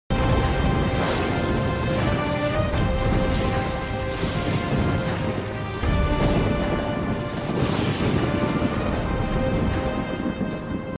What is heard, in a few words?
title card bgm; mahasamudram